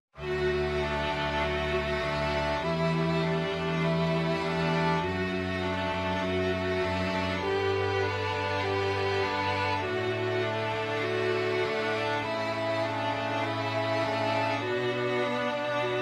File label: STRING QUARTET